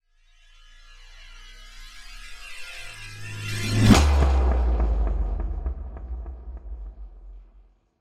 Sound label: Trailer Hit